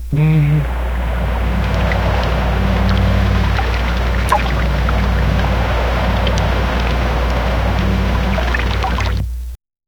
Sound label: cold arctic wind blowing